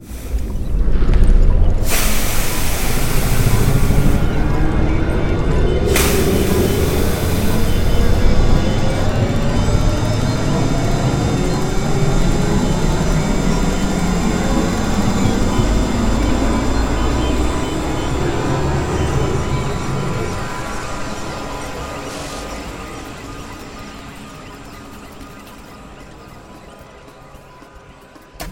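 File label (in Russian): sound of a metro train or heavy train departing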